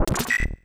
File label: Said something nasty & Grumbled about today's youth; mother2